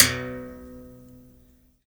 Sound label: BERIMBAUS; Heart of Africa